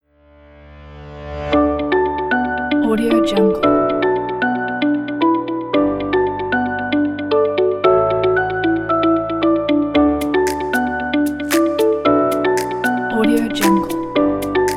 ambient music

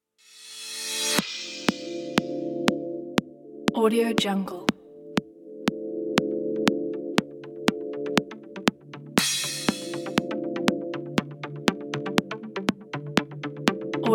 stylish modern disco house; junglerobots

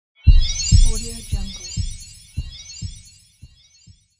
magic heart

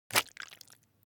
human body intestines hit

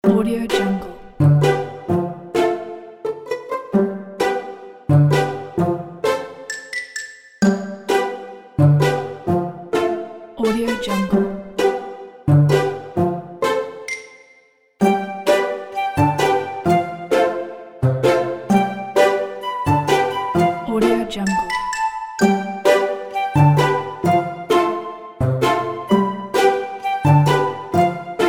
comic

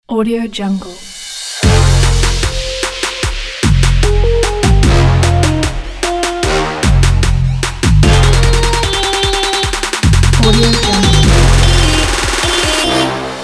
trap

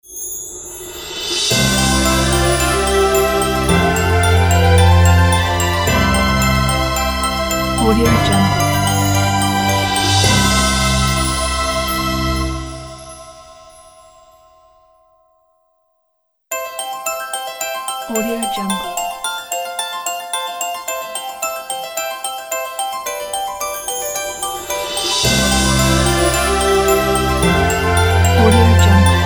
christmas opening ident

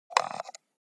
foley office computer mouse wheel scroll fast; smartsoundfx

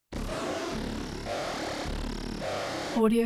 video static distortion short bursts

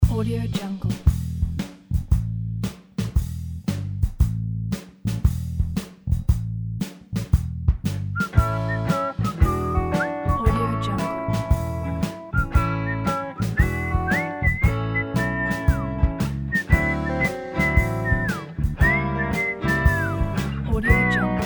circus

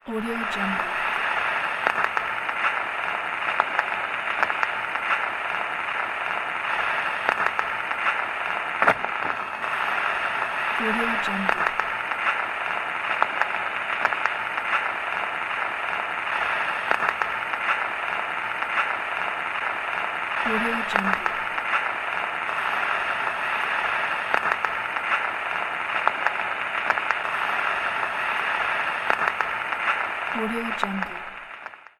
vinyl record crackling

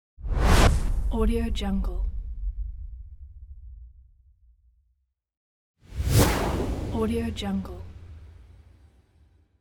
long swooshes

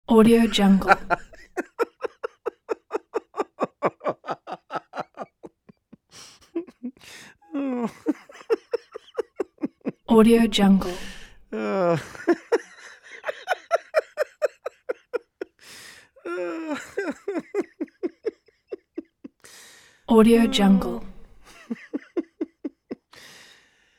male long uncontrollable laugh; sound-ideas

